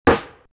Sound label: LM-2 RIMSHOT, LinnLM2 rim; drum machine